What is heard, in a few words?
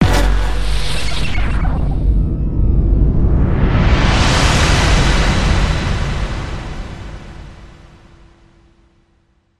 pong